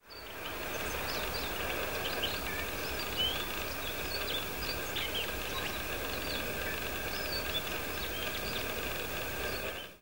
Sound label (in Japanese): nogoma, bird song; ノゴマ; ぐぜり; 新潟県新潟市秋葉区 民家庭; J-eco 鳥のさえずり図鑑